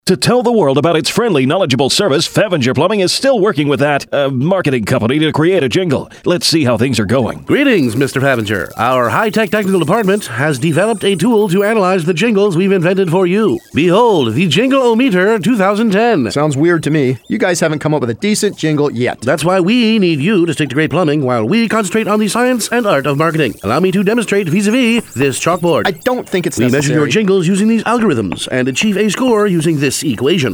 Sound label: jingleometer